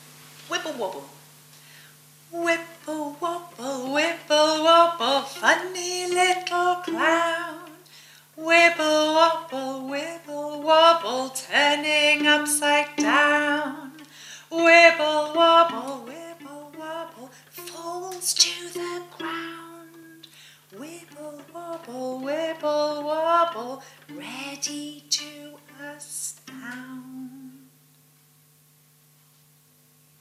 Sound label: Vocal